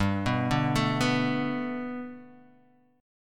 G Major Add 9th Guitar Half Step Down, chord

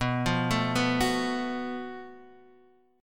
F sharp Minor Major 7th Double Flat 5th B

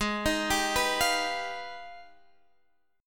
Ab Minor Major 13th Ab, Guitar Half Step Down

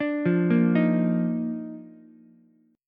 D sharp Major 7th Suspended 2nd, Ukulele; arpeggiated; JGuitar

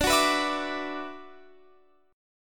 D 6th Add 9th D, Guitar, Open F; D6add9 strummed

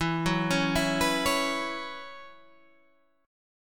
B Suspended 2nd Suspended 4th, E, chord

